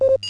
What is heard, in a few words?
dudu beep; xworkplace